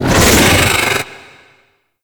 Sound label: Golden Maw Attack Vocals; Ambience; Gameplay; Warframe; The War Within